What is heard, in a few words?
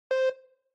Countdown